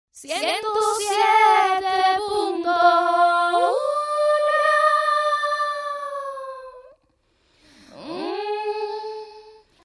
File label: jingle dial 1071 version eco, Dial 107. 1 Cantado